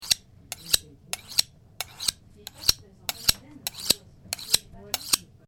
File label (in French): aiguiser un couteau